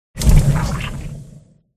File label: dog upg buff red